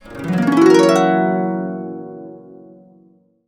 Complete Harps; USB Soundscan vol.62; AKAI

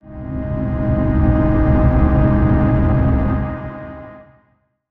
Texture 5 - C; 99Sounds; Monosounds; Music Loops; Instrument Oneshots

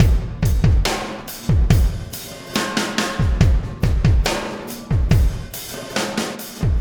Landing Party Beat, Processed Drumkits

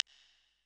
snap, Yamaha Tenori-On Snapkit

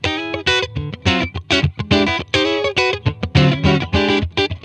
SNAKEY, Funk Guitar; 90 s Sample CDs; Zero G

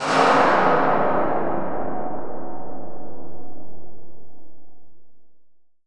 ATMO STEEL; Rarefaction; Poke in The Ear With A Sharp Stick

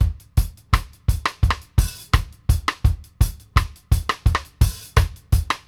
KICK ALL 4, REGGAE 84; Sampleheads; New York City Drumworks VOL-1; KD REGGAE 84

